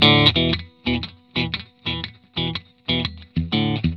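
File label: 120 E, Vintage Blues Guitar rhythm; USB Soundscan vol.22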